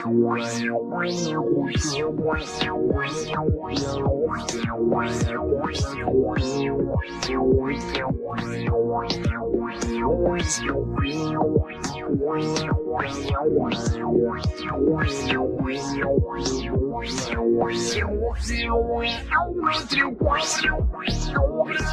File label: Vivo Top Ringtone